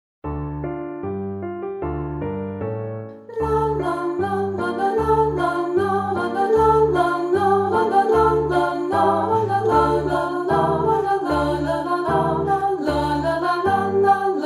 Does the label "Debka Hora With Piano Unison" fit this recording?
Yes